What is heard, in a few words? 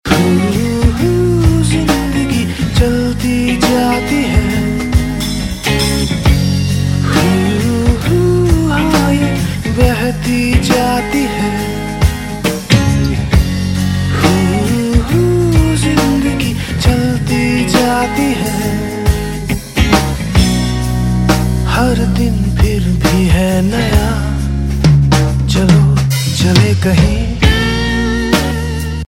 Ringtone